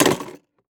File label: Industry Tool Hammer Place In Tool Box